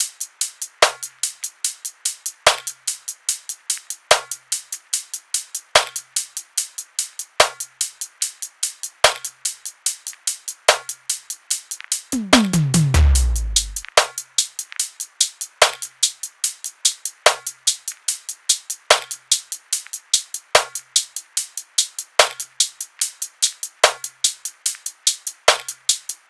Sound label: tops 73, Drum Sample Pack Loops Top Loops; SD SEISMIC; Slate